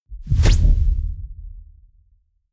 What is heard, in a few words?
sci-fi plasma gun fire fling; pistolen gewehre